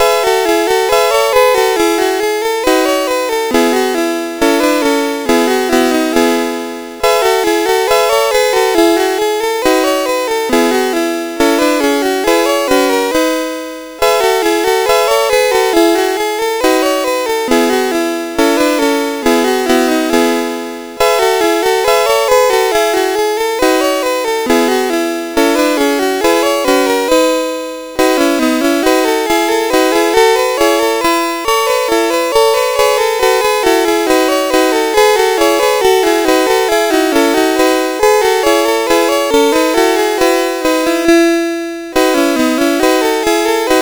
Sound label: Marsch, sid CSG8580R5; Music Shop